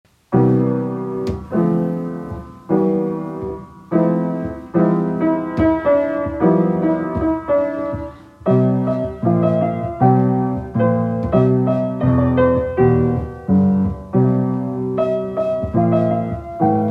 Seventh Street Blues Home Recorded, student recordings